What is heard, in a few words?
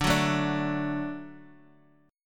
D-Major, D, Guitar, 4F, 4A, 5C, 5G, 5C, 6E; 9,9,9,7,x,x; strummed; JGuitar